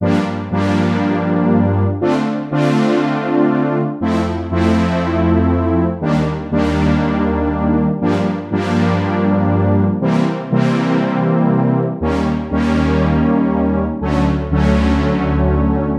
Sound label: Summer Synth Loop